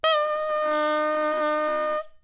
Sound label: Crow, bassoon reed; Williams